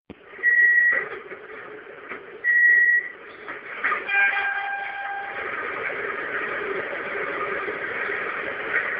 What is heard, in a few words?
Elevator